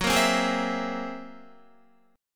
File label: F sharp 9th Flat 5th, Guitar; 4F,4A,5C,5G,5C,6E; strummed; 13,13,12,13,12,12